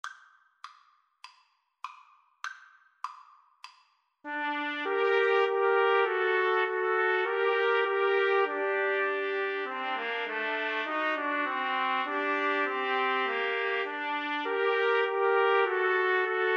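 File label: trumpet, karaoke; Trumpet Trio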